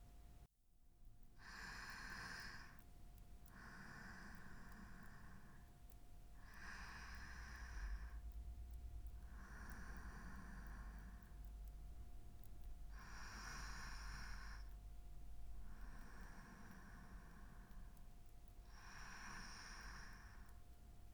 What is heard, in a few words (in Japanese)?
寝息, ASMR